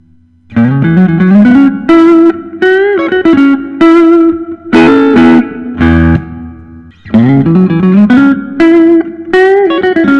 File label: gustavsson bluesmaster p90 black gloss